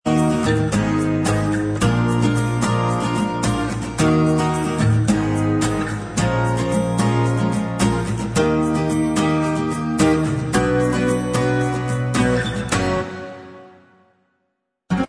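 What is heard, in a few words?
acoustic version instrumental, backing tracks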